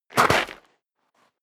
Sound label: step sneaker gravel light jump